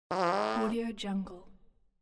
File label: farting